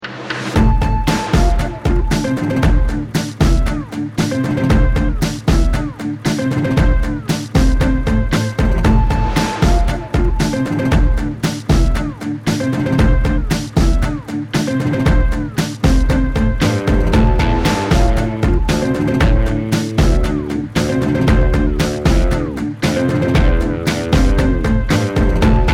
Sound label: angelica remix; Pirates; On Stranger Tides